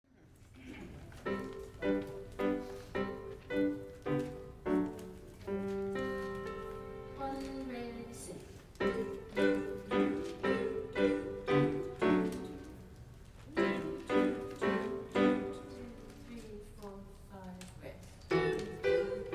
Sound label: refrain on doot; Gather Hymnal